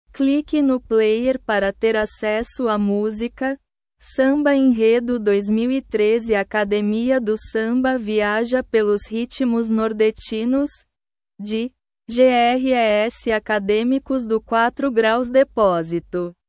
samba enredo

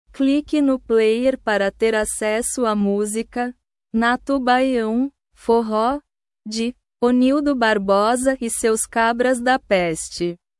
baiao forro

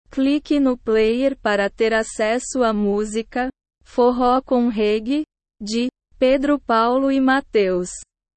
forro com reggae

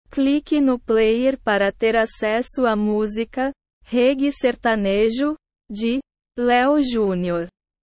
reggae sertanejo

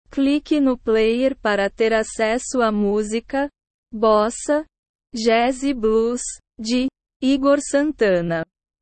bossa jazz e blues

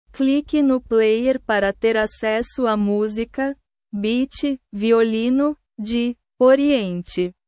beat violino

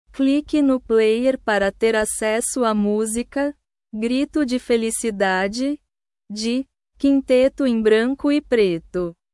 grito de felicidade

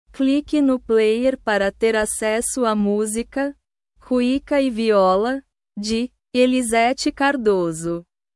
cuica e viola

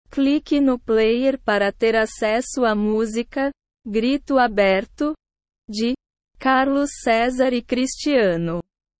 grito aberto

